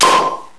korken

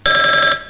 telefon

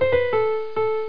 Klang

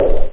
knall; Nordlicht Spiele

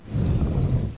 Holzauto; Loewe1

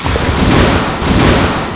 meer; winopoly

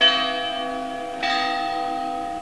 Kapelle; Anno1602

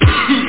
Husten; Taifun 249

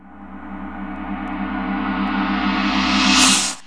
gruft

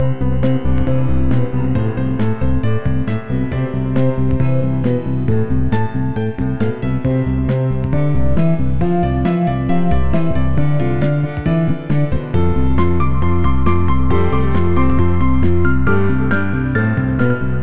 MUSIK; MOBICLIC 89

